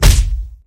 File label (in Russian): rezkij silnij udar pletyu